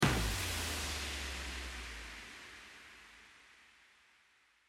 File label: bomb; Appleloops